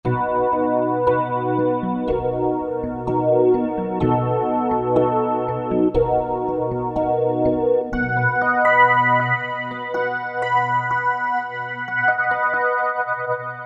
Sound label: Soft E Pno, Roland V-Synth; Factory Patches